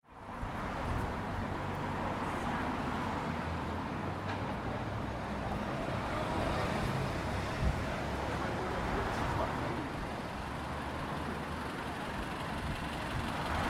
traffic and pedestrians on broad street

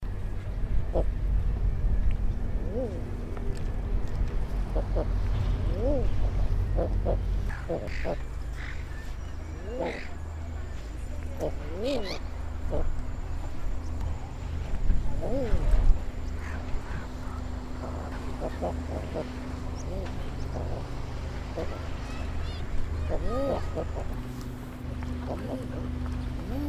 eider duck among other sounds at seahouses

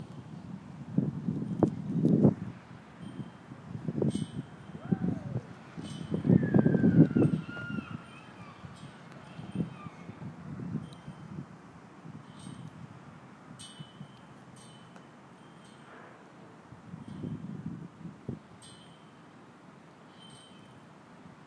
ringing playground chains

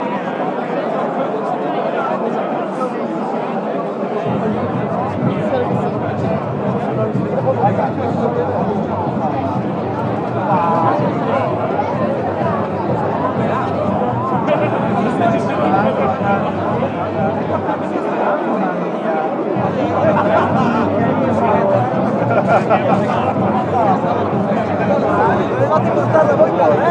the noise made by people

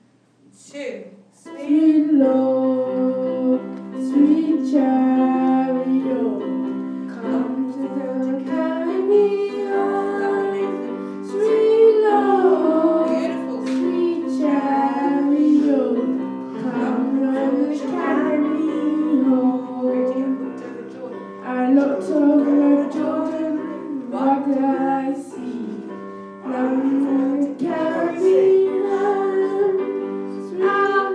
class singing

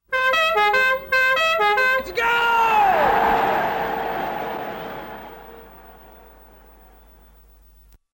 goal horn

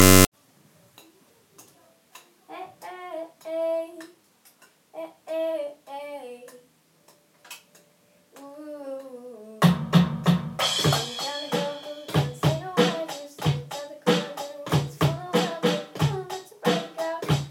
drums and singing

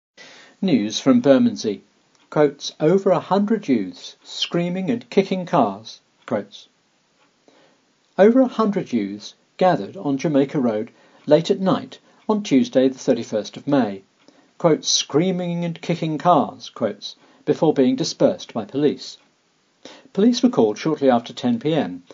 over 100 youths screaming and kicking cars

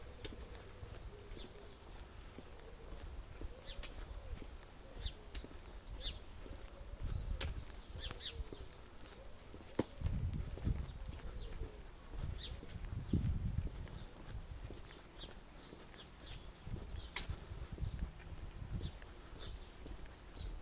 birdsong, herts

